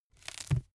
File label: book browse crackle